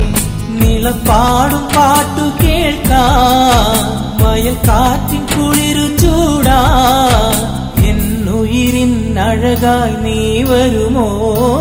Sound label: malayalam love tone